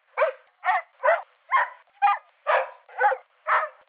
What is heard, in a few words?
Canis familiaris bark; DOMESTIC MAMMALS; barks from eight Borzoi dogs, one bark per dog